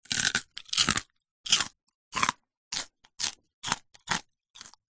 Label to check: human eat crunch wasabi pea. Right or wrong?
Right